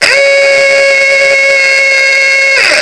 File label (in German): fanfare ford t-model hupe; die waltons